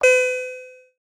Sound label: noteblock piano digital; VoxeLibre; REDSTONE; mesecons